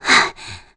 Breathe; Link breathing (low health)

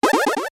Sword Magic; Shoot magic from the Sword; LTTP

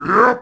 Wind Up; Darknut; Zelda

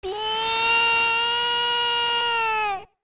Baby Goron Cry; Baby Goron crying

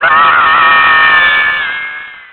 Fall; Link falling off a platform

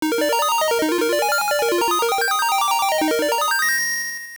Harp; Play the Tune of Currents; Oracle

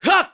Sword Slash; Skyward Sword; zelda

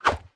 Link Jump Attack Sword; Link's jumping sword attack (sword noise); The Wind Waker; Zelda

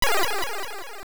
Sword Shoot; Shoot magic from a Sword (also Ramrock's laser eyes [repeats 21 times, every 4/30 seconds])

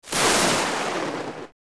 Backfire; OOT

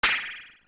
Spike Stun; zelda; OOT

